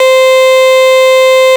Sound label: note 10s long